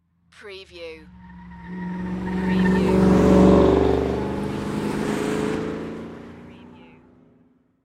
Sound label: Car Race Car Pass By Tire Screech